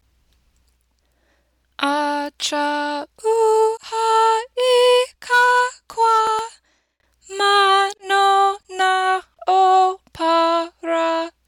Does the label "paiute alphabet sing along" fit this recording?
Yes